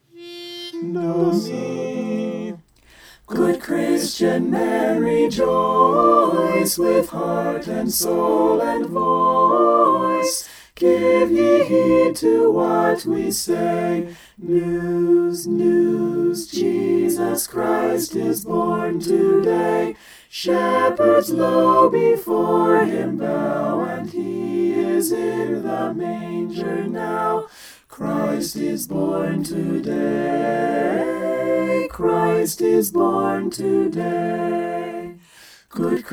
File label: good christian men mixed